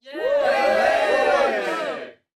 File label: cheer; The Cyclops Sensory Sound Story for SEN Learners; emotions sound story